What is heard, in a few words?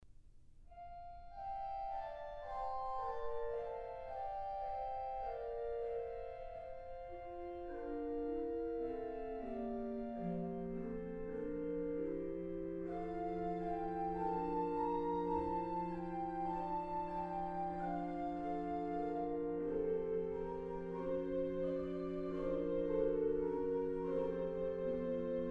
south african organ music; devinum mysterium